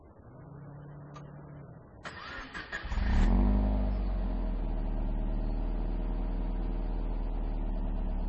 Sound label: Toyota FT 86 II Concept Engine Start Sound